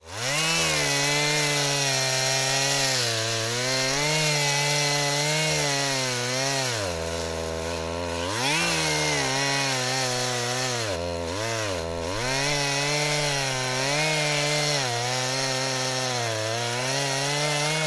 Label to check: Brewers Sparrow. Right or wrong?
Wrong